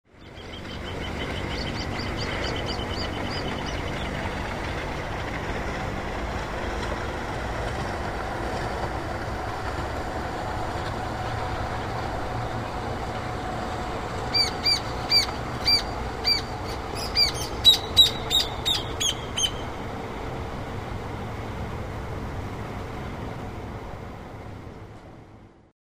parques rio parana lancha cruzando; parques norte moreno y el rio parana; Rosario

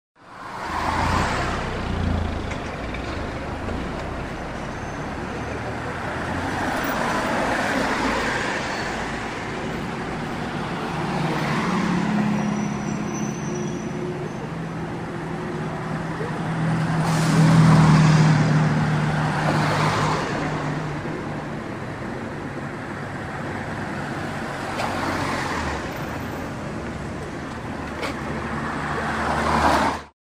calles bv rondeau carril del medio; Rosario

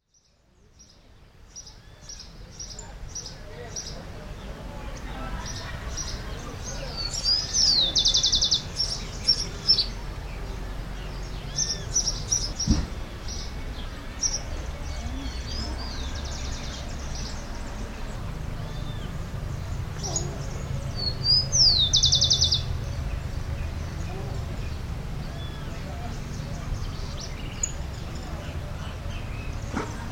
rio orilla; carcarana

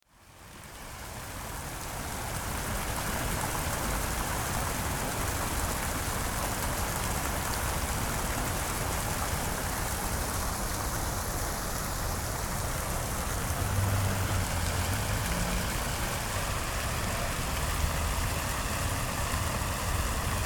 cusco plaza de armas fuente agua; peru

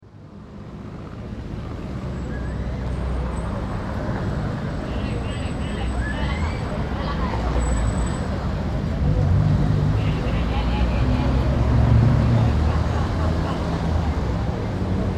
calles av san martin y andrade; Rosario

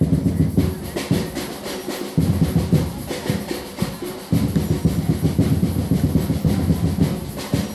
carnaval comparsa; barrio latinoamerica; comparsa arizu; Paisajes Sonoros de Rosario

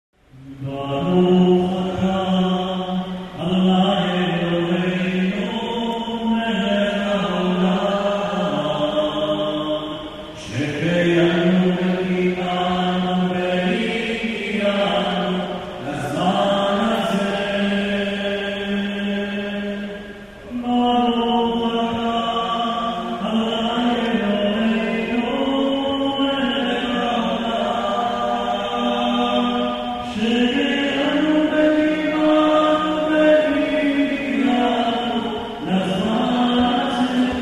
religion celebracion rosh hashana; 5758

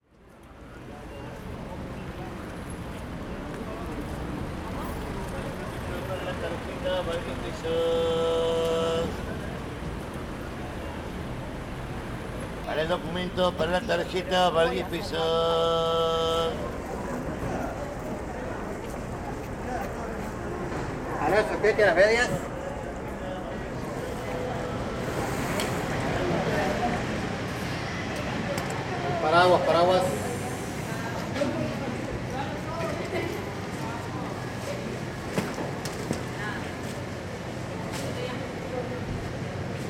recorrido peatonal cordoba, rosario; peatonal cordoba 1200

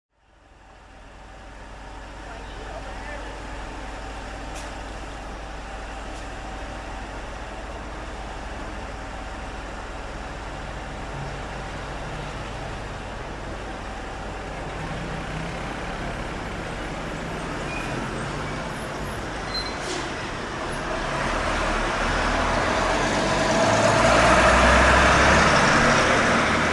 calles; al carajo y al carajo

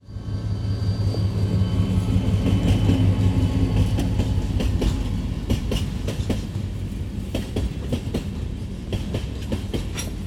tren carga y vias; ghione

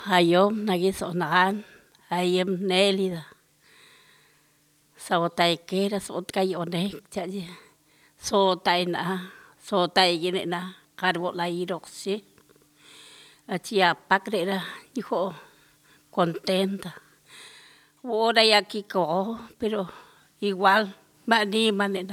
canciones de cuna, pampa del indio chaco; canciones de cuna 3

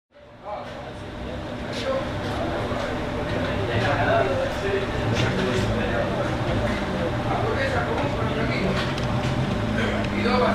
bares carrito

